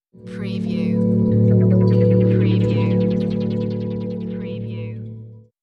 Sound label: Scifi Audio Logo Ident, Pleasant, Soft, LFO filter